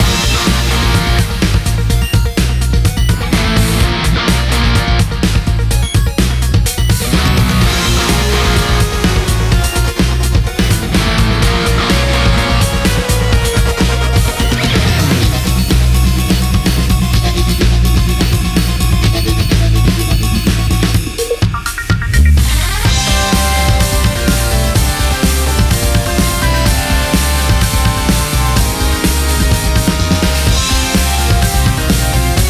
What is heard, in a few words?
Warning; honkai impact houkai 3rd original soundtrack